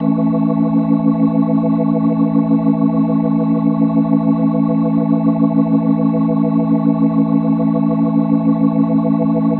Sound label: Organ A Maj; Ambient Cinematic; Organ Licks